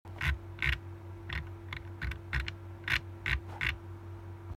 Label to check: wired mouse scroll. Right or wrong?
Right